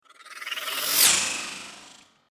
Woosh dry; افکت صوتی

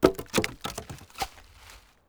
Chopping wood with an axe

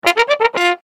instrument trombone; افکت صوتی

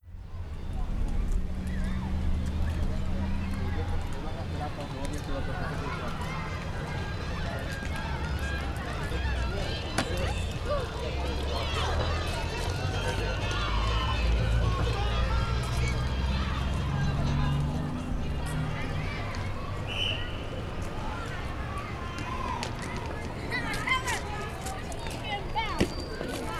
school children

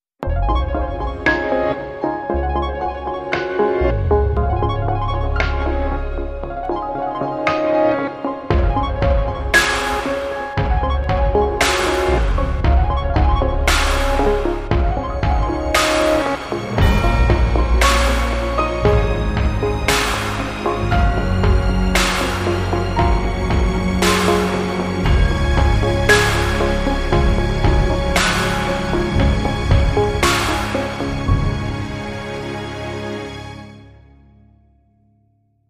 intro music

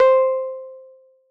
notification mobile

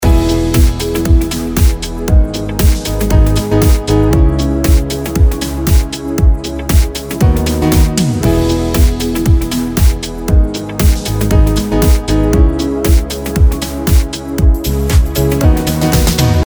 Dream Sky Loop; Motion-Array-Dream-Sky-Loop

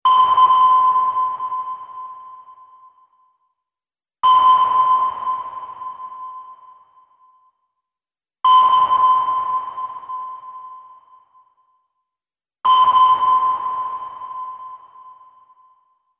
sonar

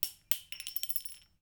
puke

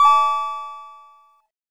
notification mobile

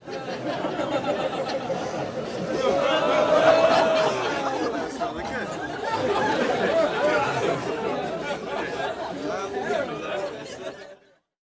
party

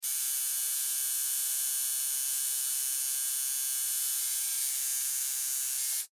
tattoo

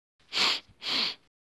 woman crying

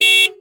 car horn

